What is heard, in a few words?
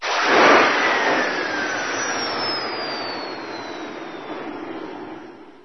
turb down; svencoop; hc2